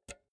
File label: pull out jack multi socket fast